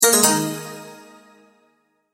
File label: multimedia tone end